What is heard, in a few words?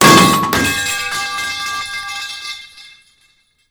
metal pipe sound